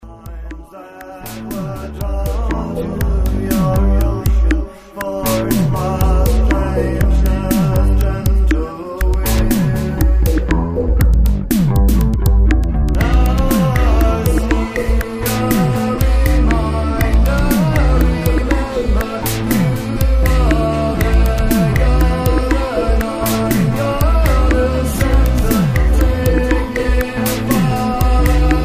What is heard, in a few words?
Pop rock